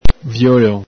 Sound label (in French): violon